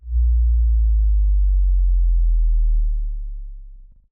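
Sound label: Explosion Dome Wall low frequency effects; Boomtown; Titanfall 2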